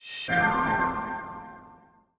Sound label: pickup urchin; Space Otter Charlie